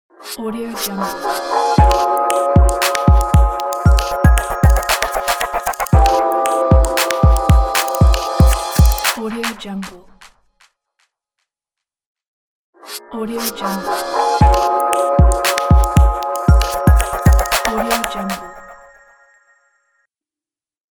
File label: digital technology logo pack